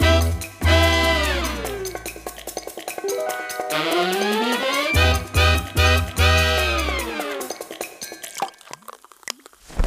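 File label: food world music paradise suspens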